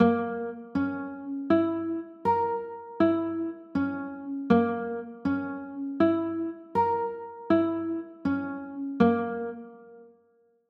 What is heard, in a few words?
b flat dim, chords